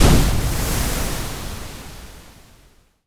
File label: SPLASH Big Noise; mono; UltimateFishing